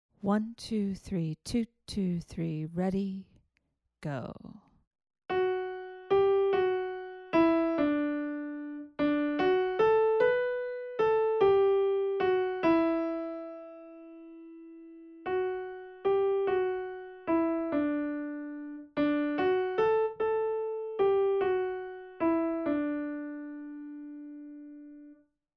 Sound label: melodic dictation